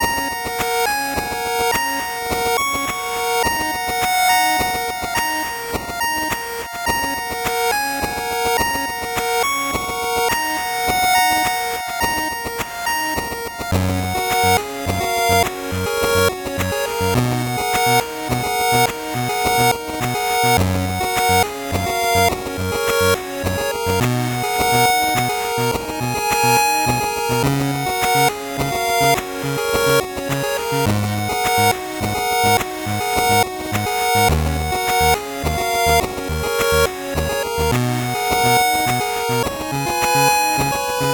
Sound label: 8bit bgm normal